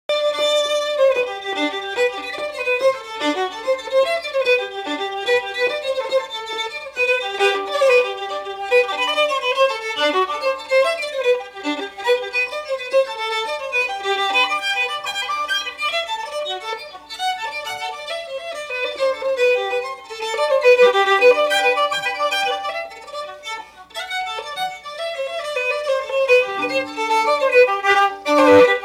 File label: Irish Jig